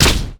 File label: gangplank pistol; LoL; special forces gangplank